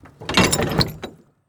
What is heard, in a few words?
Semi Trailer Door; Large Vehicles; Blastwave